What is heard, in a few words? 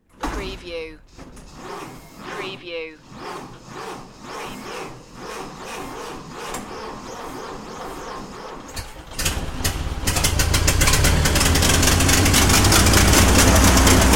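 Model T Starts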